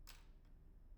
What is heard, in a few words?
pendrop; IEEE AASP